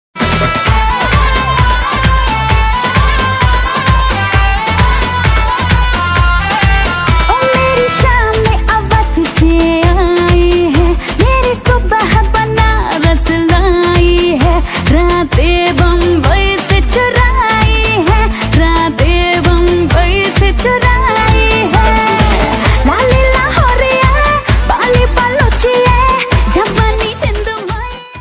female version ringtone

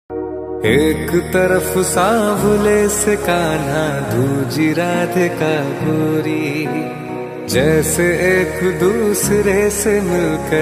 ringtone

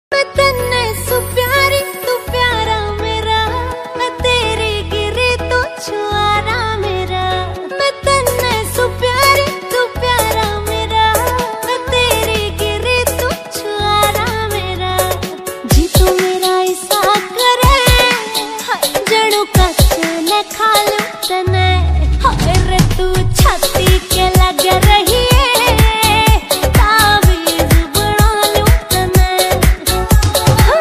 song ringtone haryanvi new song

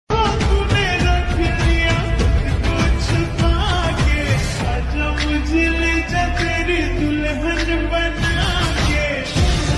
slowed reverb ringtone lofi tone

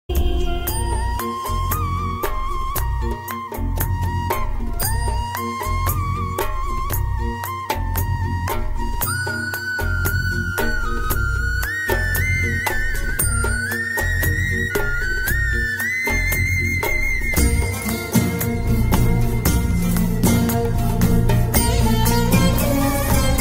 flute ringtone

